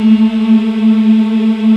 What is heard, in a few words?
African Mm m; Roland LCDP11 Africa VOL-1